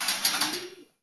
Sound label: Bird Trapped Metal; Zomboid 41.78.16; Project Zomboid